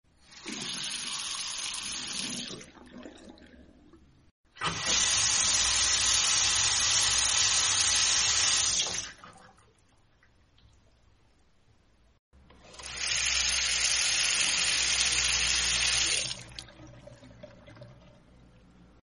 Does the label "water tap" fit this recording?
Yes